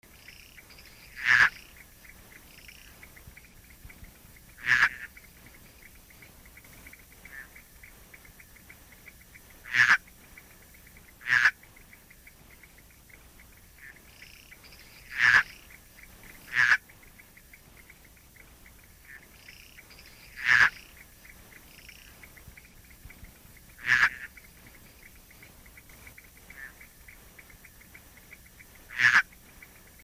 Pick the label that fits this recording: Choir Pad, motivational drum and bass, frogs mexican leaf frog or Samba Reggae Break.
frogs mexican leaf frog